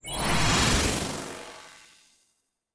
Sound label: Tiger Spell; Chasse Tiger Spell C1